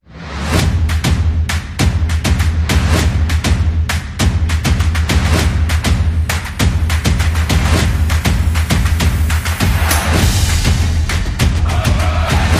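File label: Fan Zone, sport percussion and stadium anthems; football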